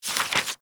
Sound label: Pickup Paper; Warframe; Cephalon Weave